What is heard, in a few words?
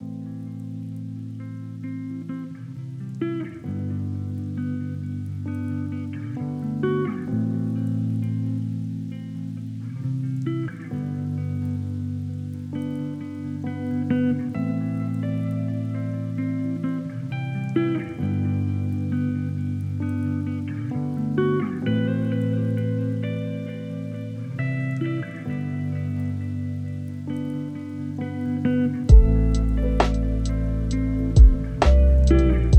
lofi hip hop